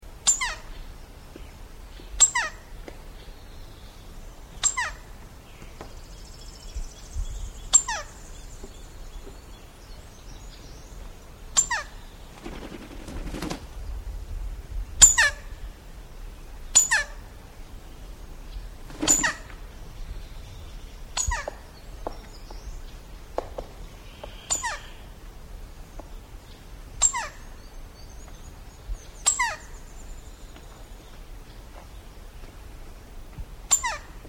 cream backed woodpecker

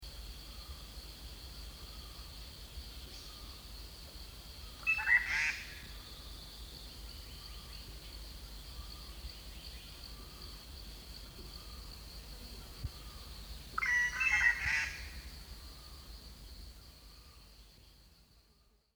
green magpie

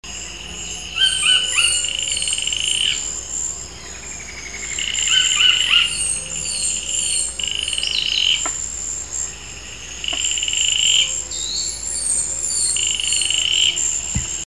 pectoral sparrow

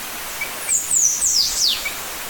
st lucia black finch

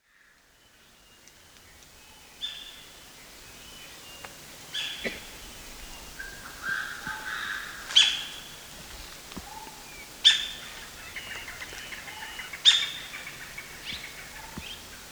streak throated woodpecker

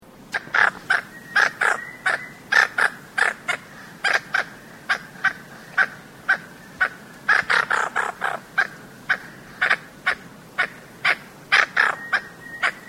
blue banded toucanet

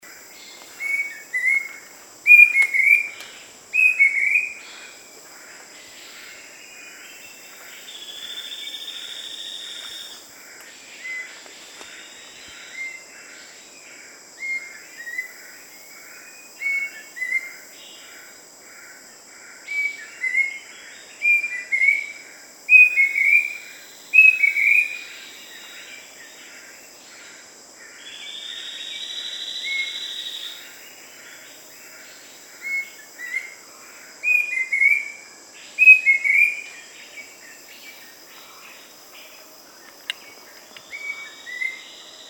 rusty breasted cuckoo

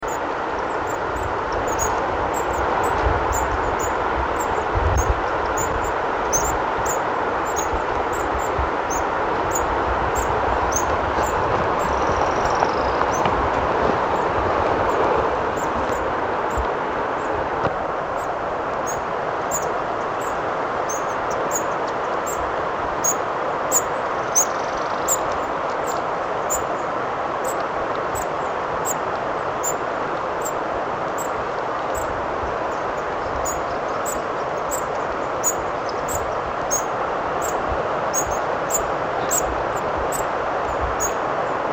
short tailed finch

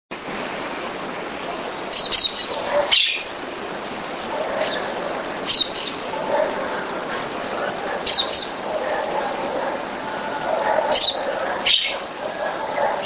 plain parakeet

